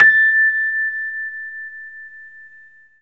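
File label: Rhodes, A5, right; 3D Sound; 3D Keys VOL-1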